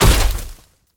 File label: step run